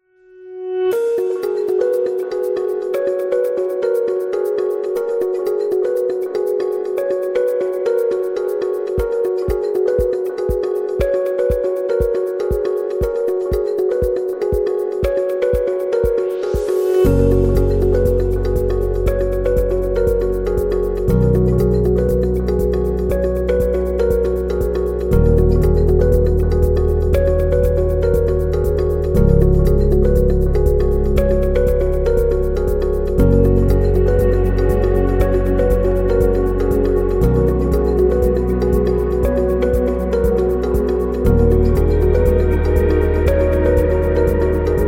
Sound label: Soft Corporate; corporate music vol 3